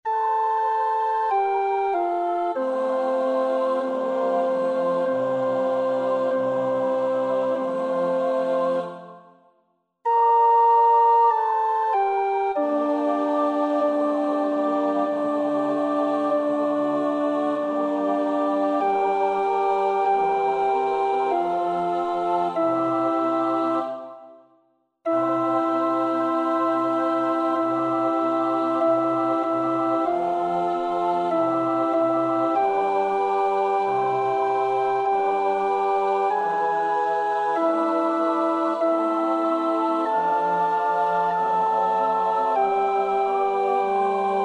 alt, koorpartij oefening; Bruckner (Anton); Christus factus est (WAB 09)

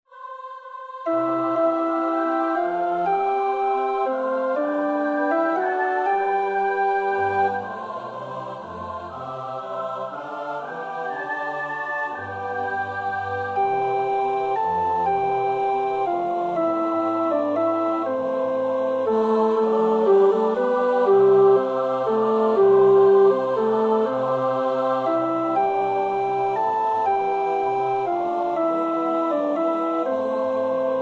In dulci jubilo vers 3 (tenor 1); Praetorius (Michael)

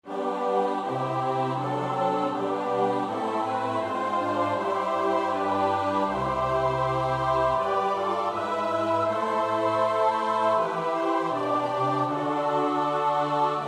S-A-T-B, koorpartij oefening; Part III- On the Third day of the Festival of Christmas; Choral- Ich will dich mit Fleiß bewahren

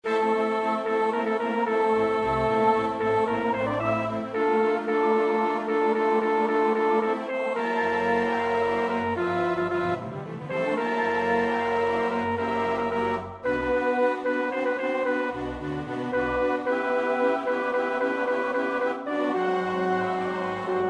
bariton 1, koorpartij oefening; Poulenc (Francis); Stabat Mater

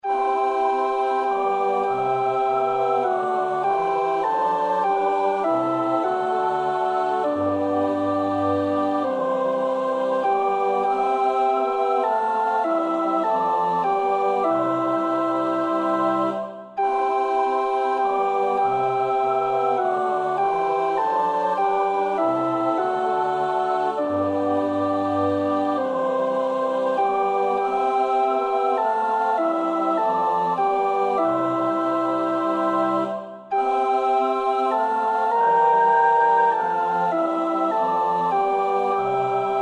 alt, koorpartij oefening; Bach (Johann Sebastiaan); BWV 019; 7 Lass dein Engel mit mir fahren

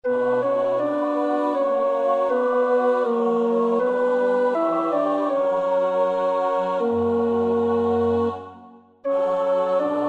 tenor, koorpartij oefening; Bach (Johann Sebastiaan); BWV 084

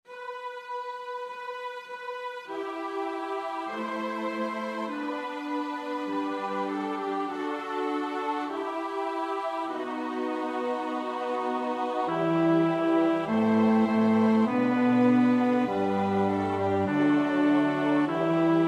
bas, koorpartij oefening; Schütz (Heinrich); Koordelen SWV 279

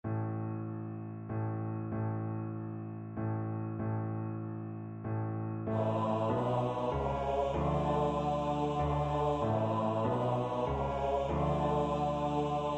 Eindelijk komt men 's avonds, sopraan; 6c.Eindelijk komt men 's avonds

